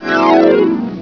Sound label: descend; CLICKS